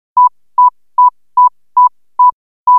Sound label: Top Beep Sms